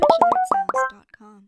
Goofy Follow

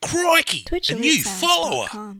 Aussie Follower

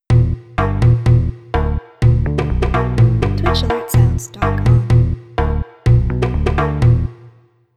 Jungle Host

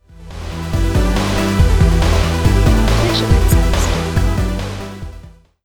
Synthwave Raid

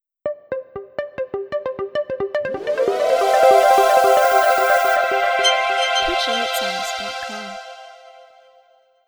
Atmospheric Donation

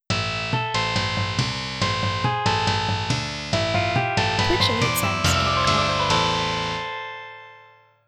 Medieval Raid

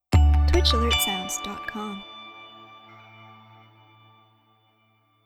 Simple Follow

